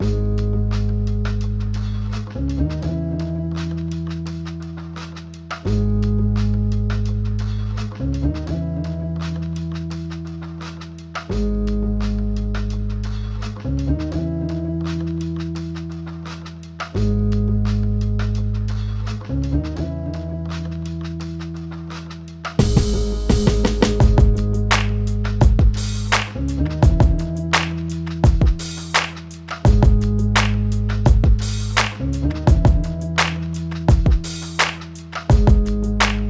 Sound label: Hip Hop 85BPM Abmin